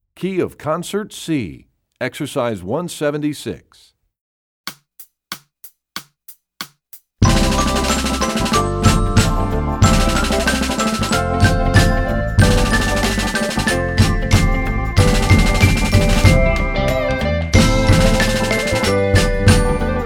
Percussion; Rubank Studies Concert C; EE2000 Book2

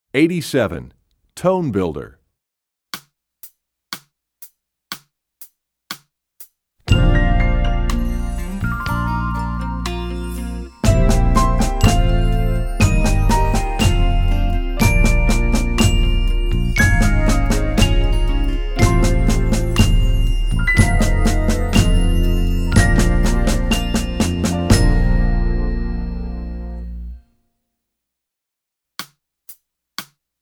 Tone Builder, Percussion; EE2000 Book2

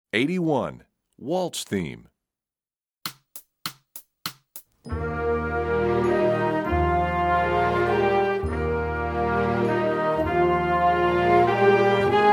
Waltz Theme; EE2000 Book1

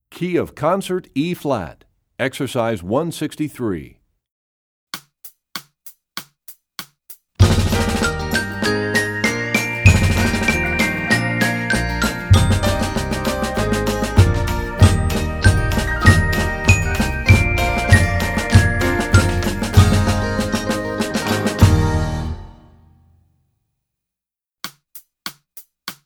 Studies Concert Eb, Percussion; Rubank; EE2000 Book2

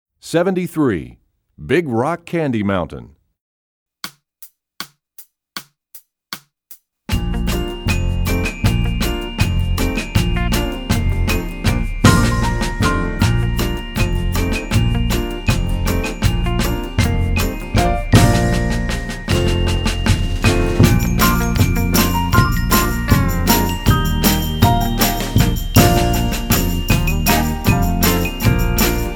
Percussion; EE2000 Book2

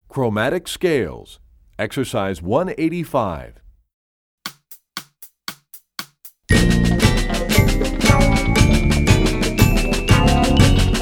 Chromatic Scales, Percussion; Rubank Studies; EE2000 Book2

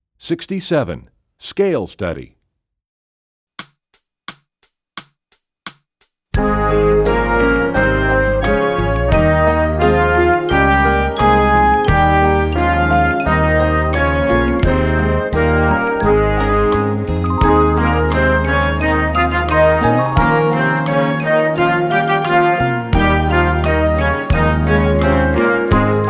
Scale Study; EE2000 Book2

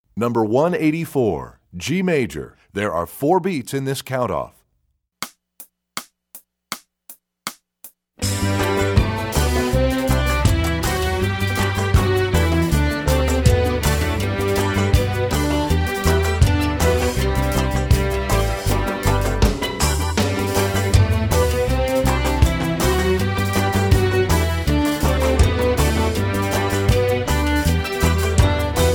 G Major, Strings; EE2000 Book1

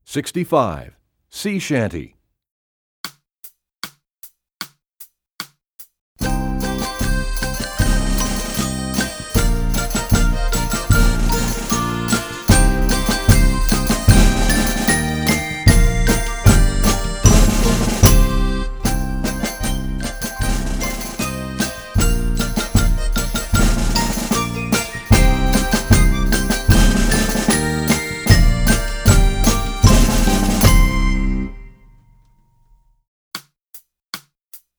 Sea Chantey, Percussion; EE2000 Book2

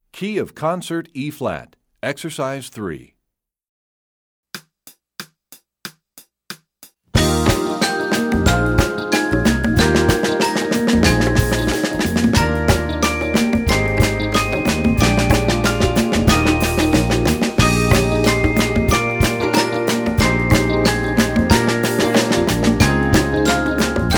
Concert E flat, Percussion; EE2000 Book1

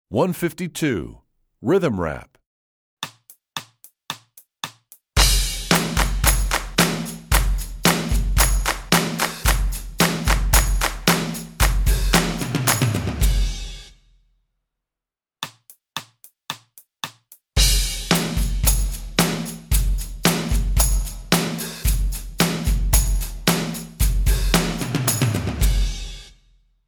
Rhythm Rap, Strings; EE2000 Book2

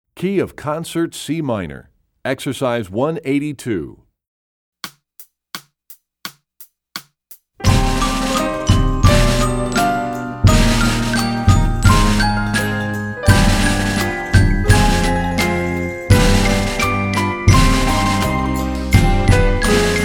Concert C Minor, Percussion; Rubank Studies; EE2000 Book2

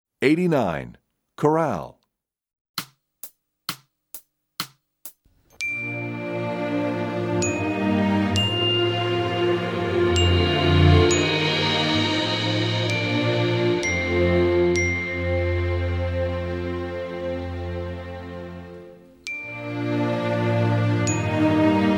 Chorale, Percussion; EE2000 Book1

